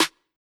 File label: Open Snares